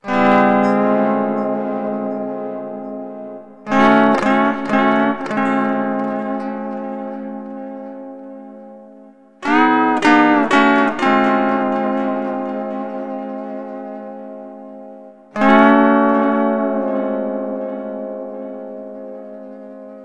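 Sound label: Sunrise Guitar Chord C, Lounge; Zero-G Kocktail Kollection; Construction Kitz (Lounge); Sunrise Mixes 082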